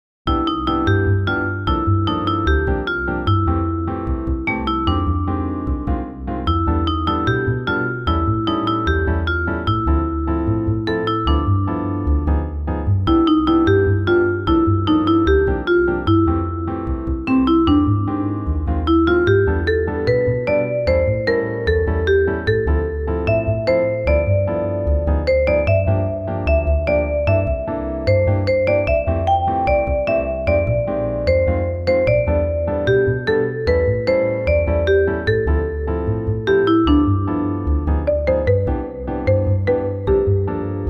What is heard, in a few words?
Bossanova